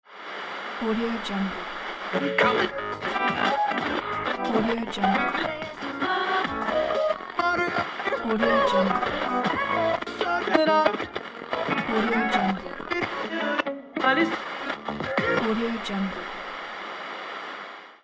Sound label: radio tuning transition scanning; jdproduction